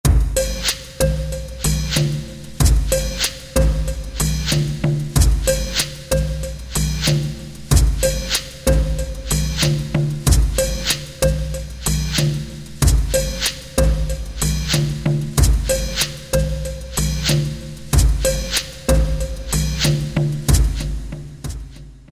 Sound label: African Desert, Korg ES-1 Factory Patterns